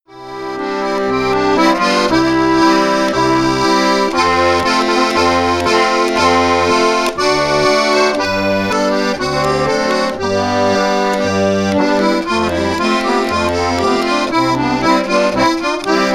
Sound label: Harmonica Hohner 2915 Goudbrand GC; De Wijnpers; Staalkaart